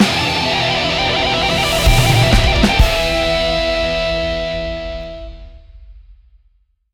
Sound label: Boss Death Riff; Mafia Boss